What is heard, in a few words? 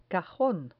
cajon